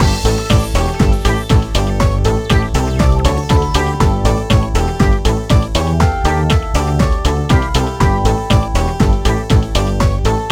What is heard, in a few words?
Menu; Polar Rampage; NDS